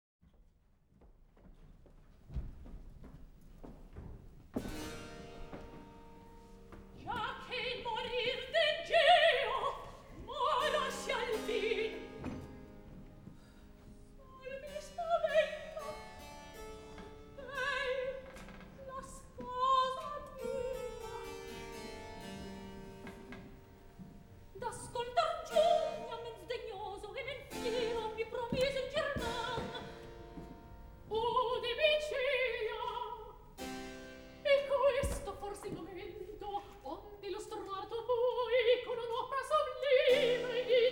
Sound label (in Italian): Atto terzo Recitativo; Scena 1; Celia, Cinna; Mozart; Operas; KV 135 Lucio Silla - 1772